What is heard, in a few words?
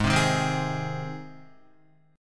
Ab Major Ab down Guitar; strummed; JGuitar